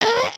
headspin, emotes

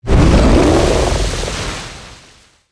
Fire Breathing; creatures organic

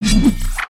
Samurai Spin; Valiant; aronwk collection; ndaudio

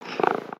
Pet Cat Purr; creatures pets; Lego

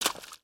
Foot Swamp Water Run, footsteps

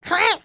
Trex Excited Roar; creatures organic

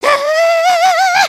freak out, emotes

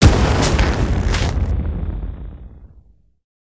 Maelstrom Snowman, destructibles

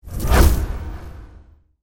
torch by oneshot

